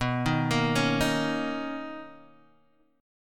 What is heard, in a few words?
B Major 9th B Guitar Open D